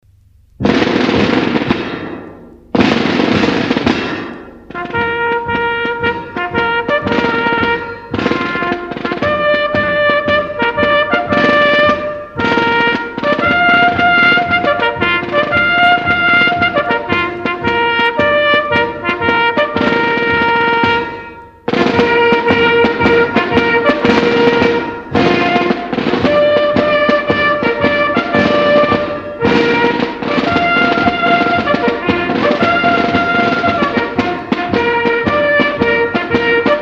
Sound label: Band